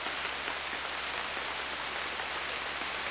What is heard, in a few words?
rain